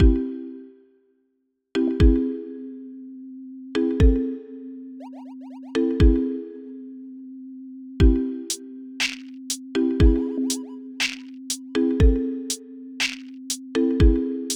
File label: Jingle